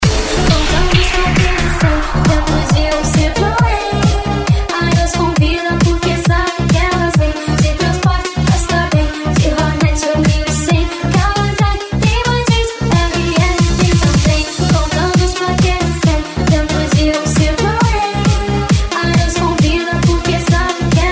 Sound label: electro funk; na balada